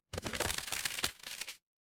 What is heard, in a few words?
paper fabric crumple